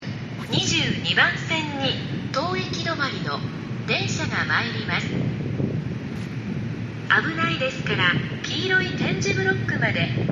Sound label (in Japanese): 駅不明（関東のどこか）